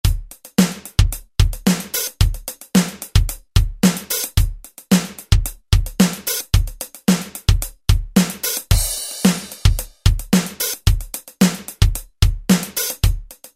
Funky, Alesis SR-16, User Patterns

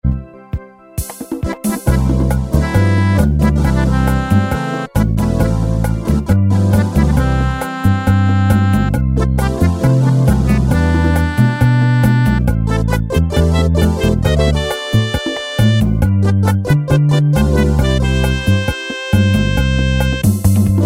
cha-cha, Technics SX-K450 Automatic Rhythms

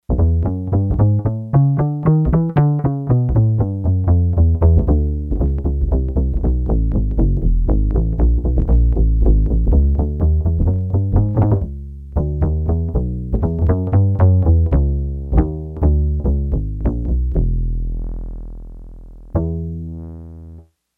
String Bass, Yamaha TX802; Factory Patches; Voices

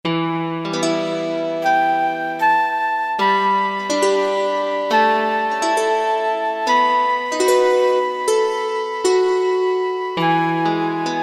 Folk Jam, Kurzweil K2000 Orchestral

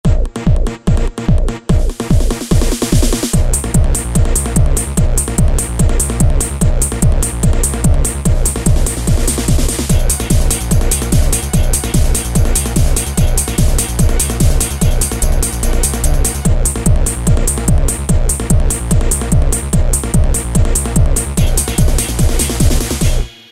Acid Tech

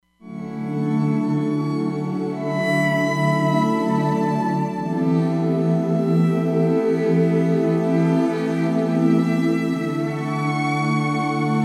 pad; Roland Fantom XR

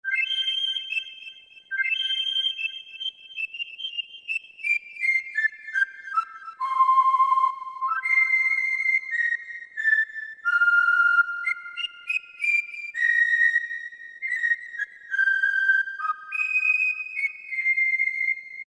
SL Lips, Yamaha TG77; Factory Presets

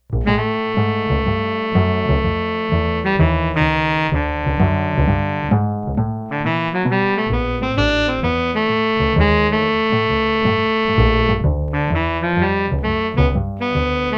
UPRIGHT BASS SAX

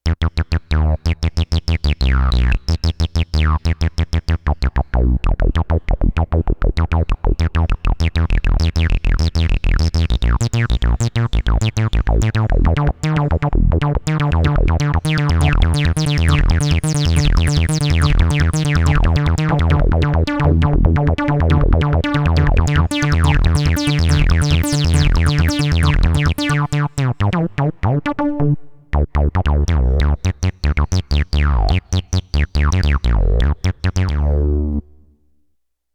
Acid Bass, Roland VC-1

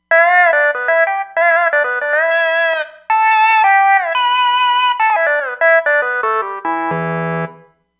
Soul Lead, Roland Fantom XR

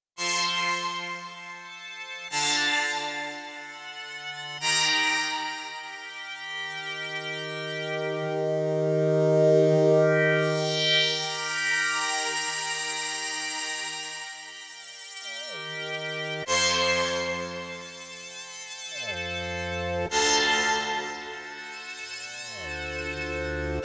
Korg Radias-R

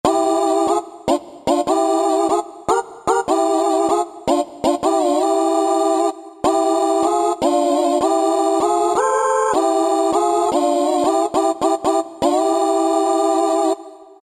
LO-FI VOX, Roland SC-880; Factory Patches

